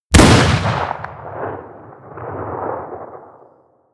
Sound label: pistol muzzleloader crisp stone; schusswaffen